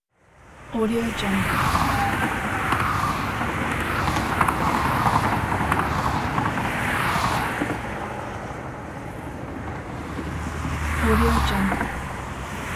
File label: highway traffic; jablonczay